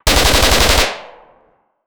machine gun

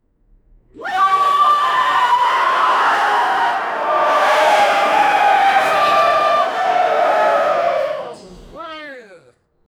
energetic crowd following

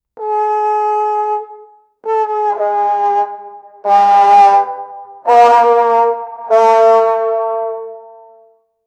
funny wah wah trombone

